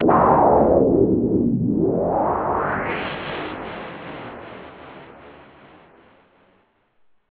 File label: Sci fi